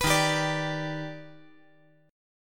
Eb Augmented Major 7th Eb down; Eb+M7 strummed; JGuitar

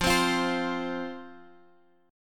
F 5th F, chord; F5 Chord {X 8 X 5 6 8} strummed

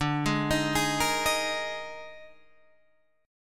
A sharp 11th

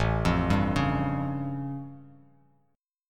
A Augmented 7th A Bass Standard; arpeggiated; JGuitar

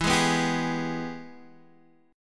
G sharp Minor Major 7th Sharp 5th E

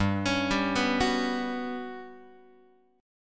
G Major 7th Flat 5th, Guitar; GM7b5; arpeggiated

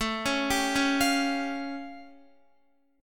A Augmented 7th A Guitar; arpeggiated; x,12,13,12,13,13; JGuitar

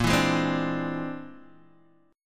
A sharp 9th Flat 5th A sharp, Guitar, Open G

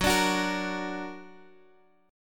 Gb Augmented, down, Guitar, Drop D

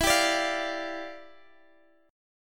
E Minor 7th Flat 9th

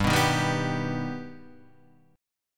D sharp Augmented, guitar chord

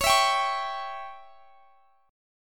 C sharp Diminished C sharp down; C#dim; strummed

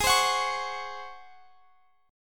Ab 11th down Guitar Standard; strummed; JGuitar

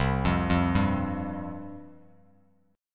C Suspended 4th C, Bass Standard; Csus4 arpeggiated; 8,8,3,0; JGuitar

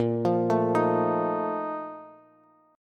A sharp Major 7th Suspended 4th, Bass; arpeggiated

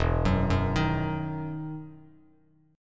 E Major 7th Suspended 2nd E down Bass Standard; arpeggiated; JGuitar

